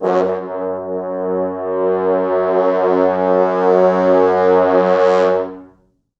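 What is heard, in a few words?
4 French Horns, Horns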